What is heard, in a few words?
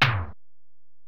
Tom, Alesis DM-PRO